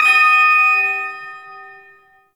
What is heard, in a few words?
Shawzin Oct Three D Sharp; Music; Warframe; Duviri; Ersatz Shawzin